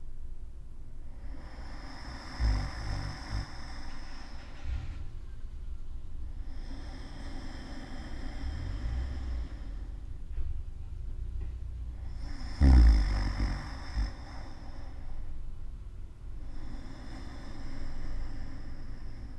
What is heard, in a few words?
Me Noises